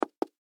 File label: Food Salt Shaker Set Down On Table; Household